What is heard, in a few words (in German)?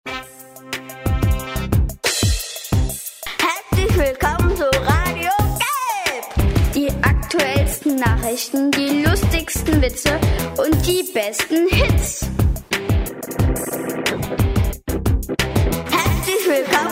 Jingle Anfang; RadioGElb 2016